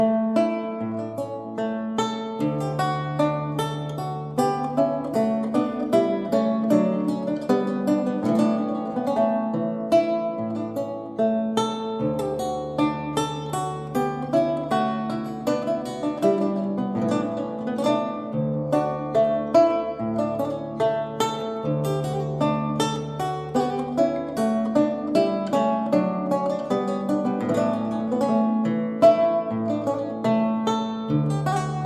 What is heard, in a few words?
Branle Simple a cordes avalees; troisiesme; Antoine Francisque; Orphee